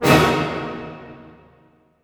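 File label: D# minor fortissimo, orchestra hits; Sound On Sound - Peter Siedleczek's Orchestra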